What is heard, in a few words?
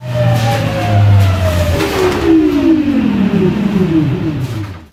train stop; digistuff nbextras